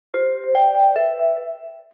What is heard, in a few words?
announce